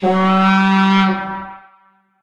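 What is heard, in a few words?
vuvuzela; source engine; tf; football manager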